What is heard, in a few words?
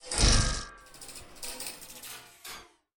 Enter Cooldown; Lethal Company